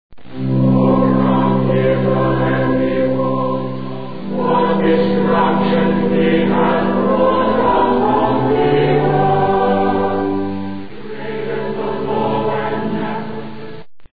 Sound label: choir; portarthur